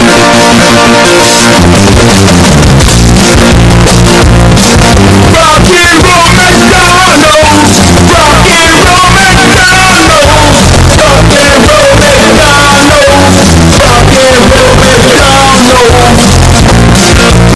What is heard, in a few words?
RockNRoll; McDonalds